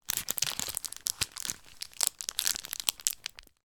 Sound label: handling a pack of batteries; foley